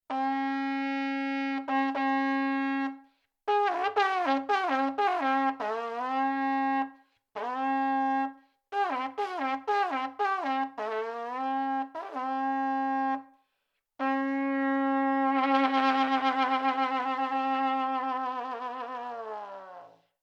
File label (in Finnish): Pieni fanfaari; Hevostorvi